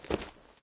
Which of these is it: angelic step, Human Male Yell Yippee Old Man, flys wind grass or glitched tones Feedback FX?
angelic step